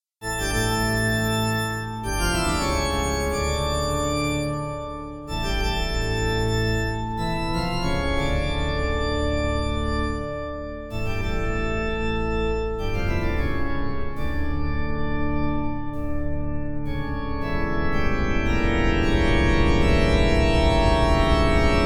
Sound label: Spooky Menu; SpeedRunners; Party Mode Demo